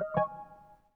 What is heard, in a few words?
new mail; soundscheme for windows; winmessenger